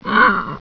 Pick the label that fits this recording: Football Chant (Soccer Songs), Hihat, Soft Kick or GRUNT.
GRUNT